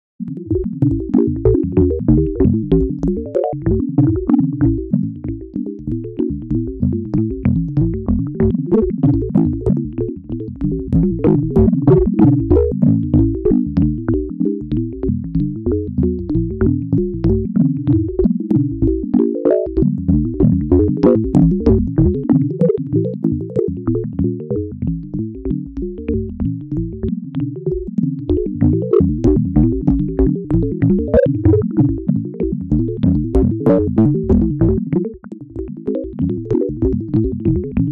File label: rockring ringmod